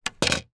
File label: pool snooker cue down on table; sport